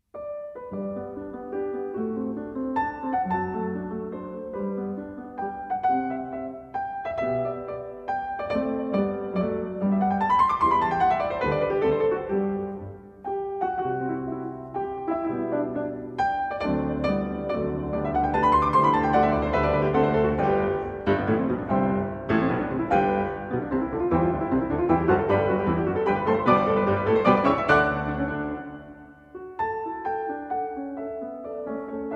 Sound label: Piano Sonata in G Major, 2 Pianos; No. 5; Arr. For 2 Pianos By Edvard Grieg